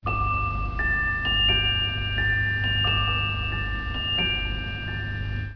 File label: ice loud; sven